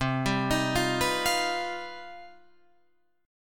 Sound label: C Minor Major 11th, Guitar, Open F; arpeggiated; JGuitar